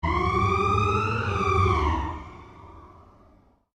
Created Cyber Whale Call, Alien Sound Effects; Created creatures, vocalisations and pretend speech

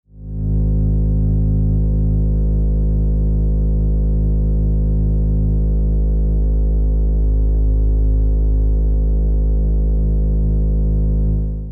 Electrical Low Hum; Production Element Imaging Element Accent Transition

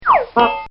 Whip And Bonk

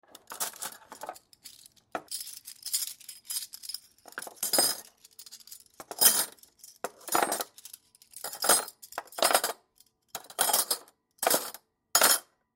Kitchen Drawer, Drawer Put Silverware Away; Drawers; Household; Blastwave

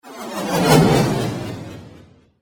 Whoosh Flashback Transition

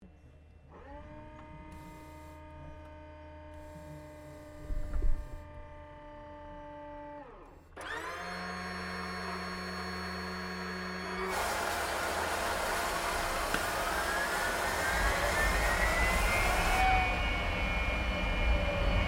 AIRCRAFT CA-27 SABRE STARTUP INTERIOR; CA-27/F-86 Sabre; Airplanes

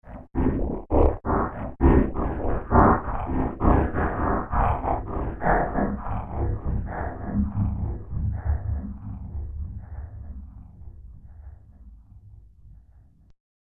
Industrial Menace, Machinery; horror sound effect; Machinery Sound Effects

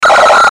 static high; Static High Squeal Warble

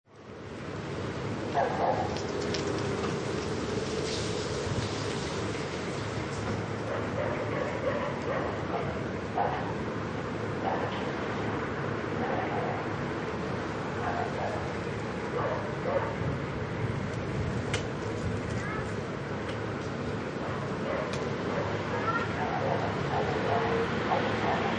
Residential Night Ambience; Outdoor Sound Effects; LynneMusic

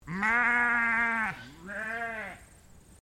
Animal Sheep Dorper Baa; Sheep on a farm in Bright Victoria; Sheep (Sound Effects:Animals)

